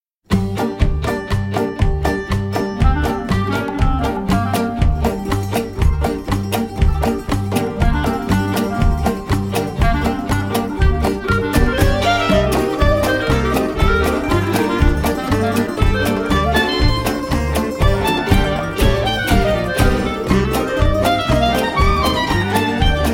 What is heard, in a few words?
Instrumental